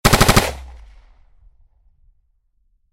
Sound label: gunfire sound